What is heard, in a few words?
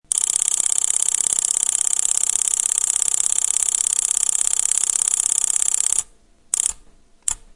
high speed mechanical relay